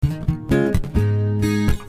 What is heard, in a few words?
Punchy Eb, Db, Abm 66